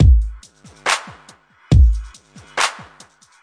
dark history kicks, drum loops and breaks, 070 bpm; 000 to 080 bpm